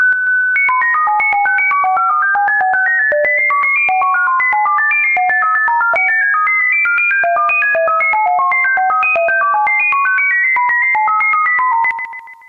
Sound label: ROS, digital modes; Digimodes